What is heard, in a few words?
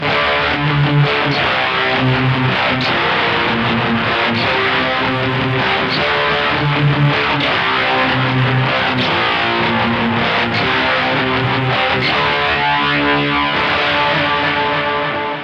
guiter